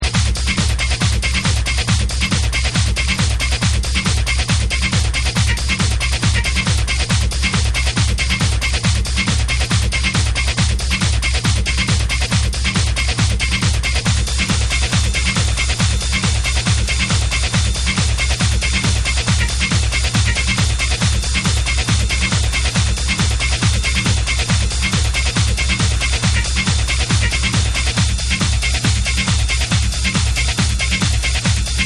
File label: ABSTRACT SYNTH